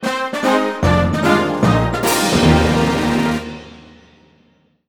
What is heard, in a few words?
Victory!; Kirby Star Allies